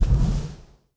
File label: reel to dust; netent